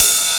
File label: Hats Electro Open